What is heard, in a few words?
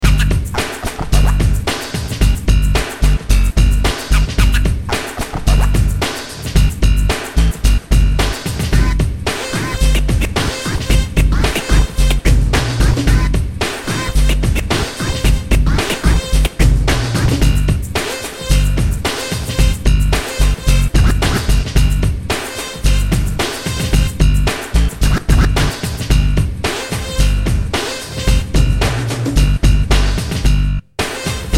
menu loop scratches